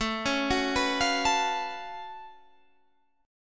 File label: Gb Minor Major 11th A